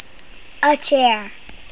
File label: chair; What has four legs, a back, two arms, but no body?